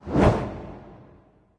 trident swing slow; smite; poseidon

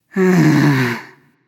nv sigh; smite; awilix

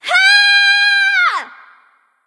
battlecry; smite; sol

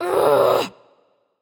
grumble; Smite; athena

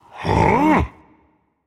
confused rage; chaac

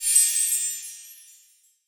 heal; Smite; Baron Samedi

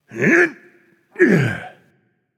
grunt jump; smite; zeus

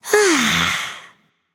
sigh, voicepack; smite; jorm

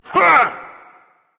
grunt attack berserk; cuchulainn

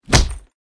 slap; smite; odin

